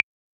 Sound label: message send